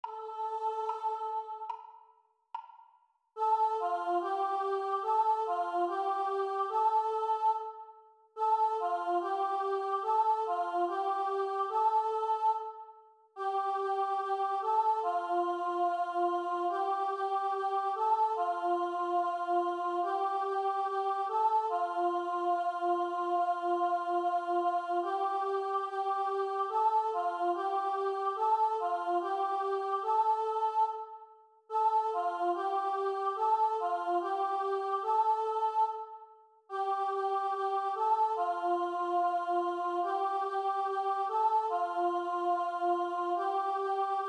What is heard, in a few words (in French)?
Chant